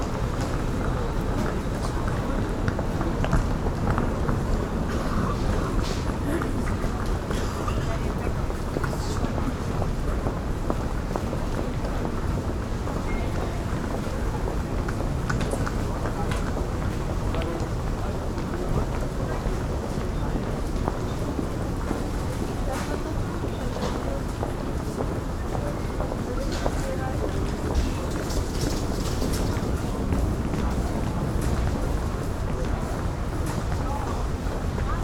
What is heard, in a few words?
Urban people footsteps babble; urban and suburban; boom library